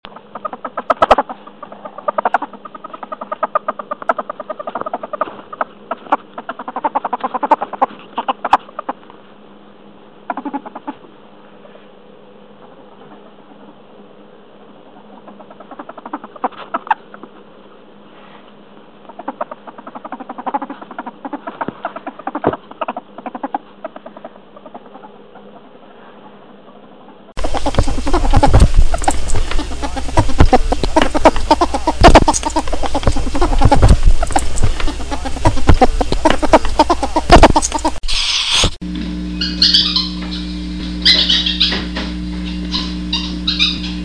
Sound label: ferret